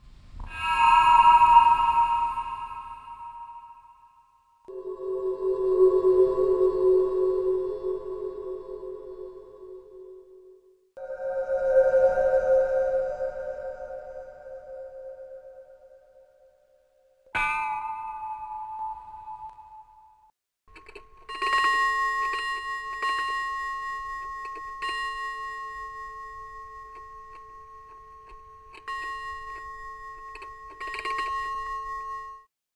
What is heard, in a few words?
bell hum; Dark Fall II Lights Out